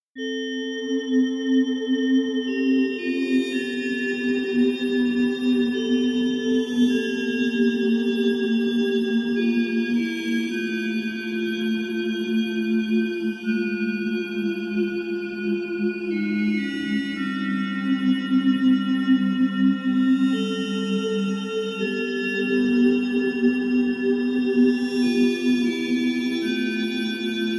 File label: Metallic Lullaby